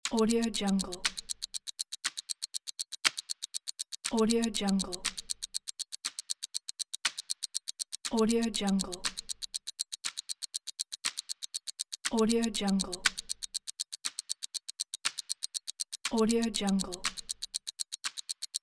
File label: watch ticking